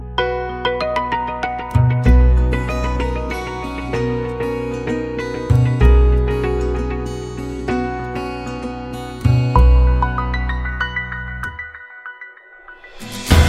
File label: Samsung, Ringtone; Samsung Galaxy Ringtone; S6